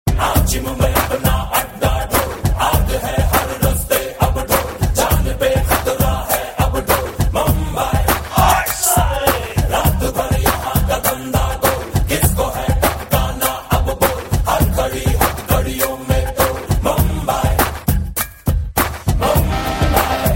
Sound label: music; mumbai